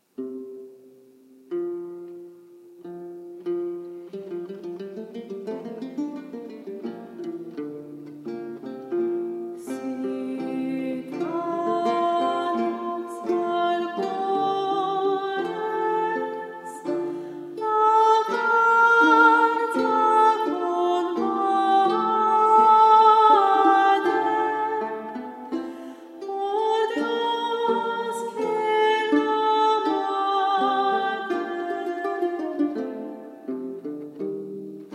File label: Vihuela Quartet; Sy tantos halcones segundo diferencia; Luis de Narvaez